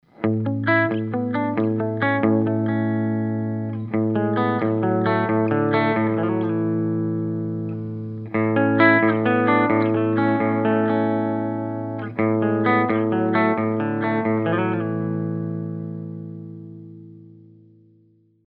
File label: clean, humbuckers; bountyhunter